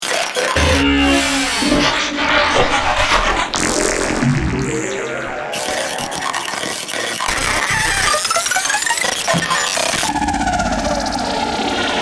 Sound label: Synthetic SFX